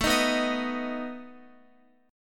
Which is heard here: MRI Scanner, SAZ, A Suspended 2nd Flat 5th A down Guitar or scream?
A Suspended 2nd Flat 5th A down Guitar